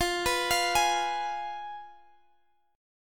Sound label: F Diminished, chord